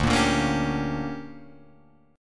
D Major 7th Suspended 4th Sharp 5th, Guitar Drop D